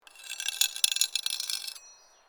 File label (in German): metall kratzen